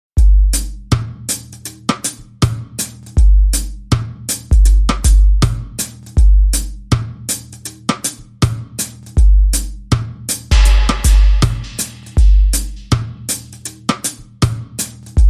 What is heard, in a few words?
Juicy Beat